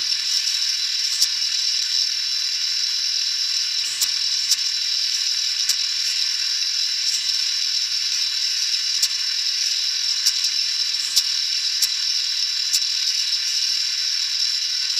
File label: sound of friction that ca, textures